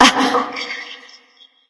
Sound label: surprised light; smite; voicepack; hel